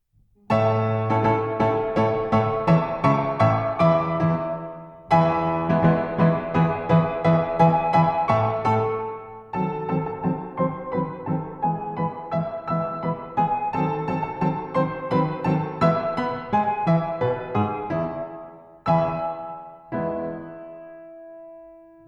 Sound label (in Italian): Introduction pianoforte; nach Mozartschen Thema